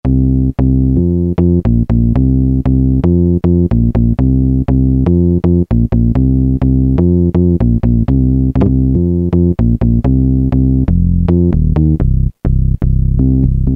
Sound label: SH101 Bs, Roland M-DC1